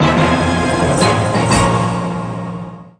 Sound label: large Win; netent; bloodsuckers